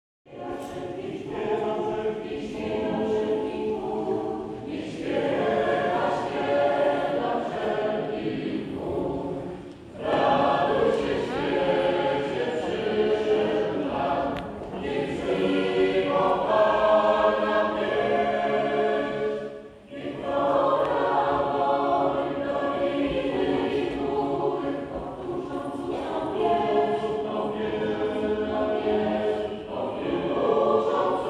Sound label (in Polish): Chor Parafialny w Tymbarku